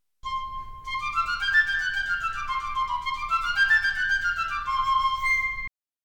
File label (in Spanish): flauta piccolo